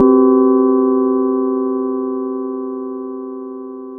Bell; EFM

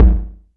BD

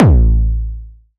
KICK

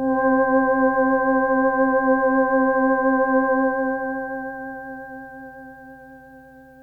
Synth, Soft FM

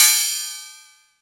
Cymbal

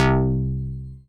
Funk Bass C2; AELITA

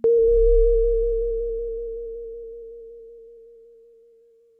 Synth, Pure Tone

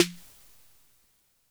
SD 808 Air

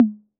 Tom Hi B 808, Clean, Digital

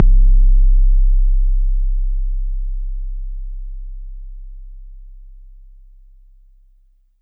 BD

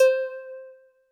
Jazz Harp Kawaii C4; keys

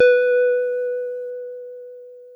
SYNTH Bellish form

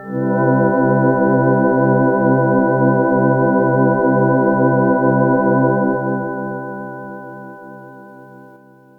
Synth, Soft FM Chord

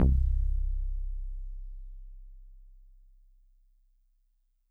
Solid Bass DX100 C2

